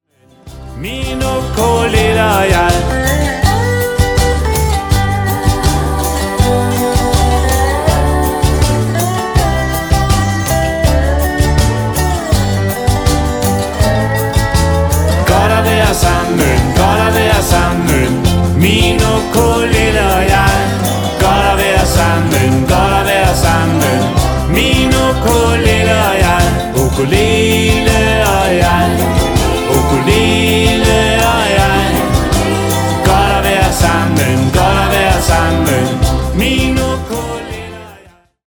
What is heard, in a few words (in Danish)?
Ukulele